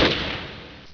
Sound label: SHOT